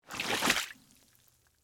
Step Water Scrape, Footsteps, Water, Scrape, Splash, Single; Human; BlastWave